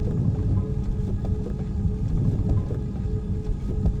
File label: elevator loop; puzzle